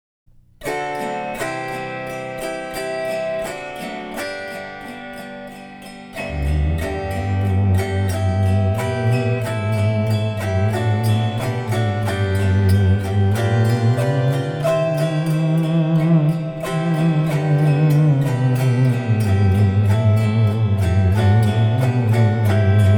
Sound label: Theremin